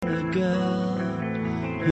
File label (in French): Arpege Amin6